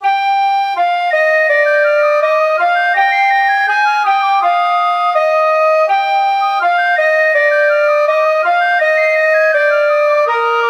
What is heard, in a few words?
Flutes